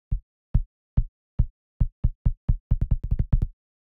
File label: kick, Techy Clicks; Extractor